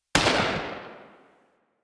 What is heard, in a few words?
Dex Sybaris Tail A; Warframe; Weapons; Tenno